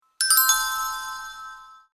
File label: result neutral; inlogic-checkerslegend